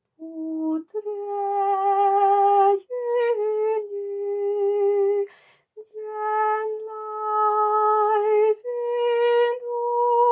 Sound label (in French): basse; Jurjaanu a puut veejinji letton; Put Vejini